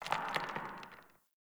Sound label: Cave Rock; Ambience; Caves; Glades Of Andurin